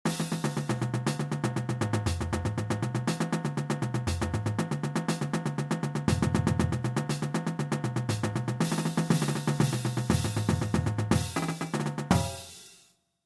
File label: Flam Spree